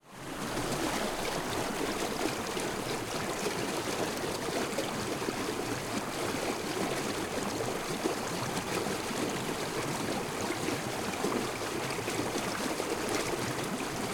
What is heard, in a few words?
Stream - STRONG - Medium Speed - Flow - Gush - Thick Surge with Tonal Burbling, Winter Forest Stream; Pole Position